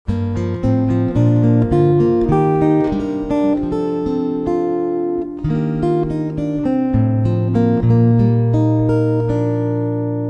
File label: Lullaby